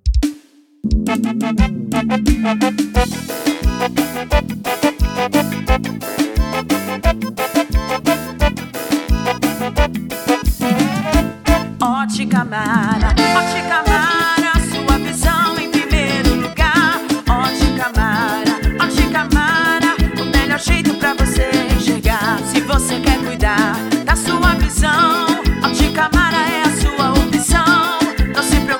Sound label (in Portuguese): jingle